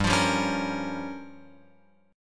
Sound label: G Minor 7th Flat 5th, Guitar Open F; Gm7b5; strummed; x,2,5,2,4,5